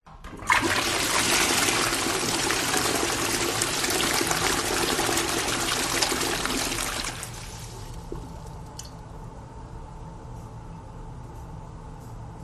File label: public places public toilet flush metal cistern fill hut